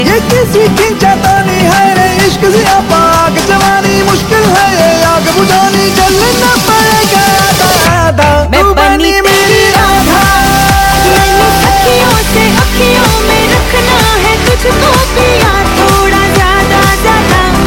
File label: Hindi Ringtone